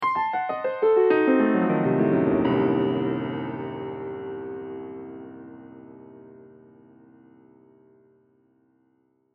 Piano Mysterious, Piano Mysterious Arpeggio Descend; Musical; Pianos; Blastwave; Sound Effects:Musical